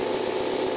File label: Engine; Buggy; PS1